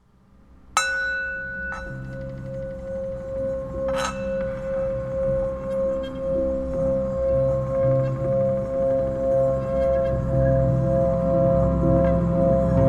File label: singing bowl